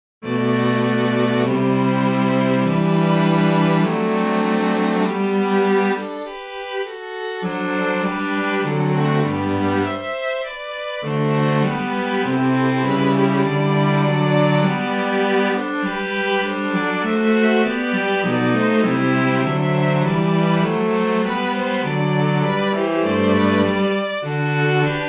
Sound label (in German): Hymnen Streichquartett